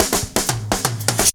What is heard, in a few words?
Rollin Hill, Drum Rolls; Zero-G Jungle Warfare 1, 2 & 3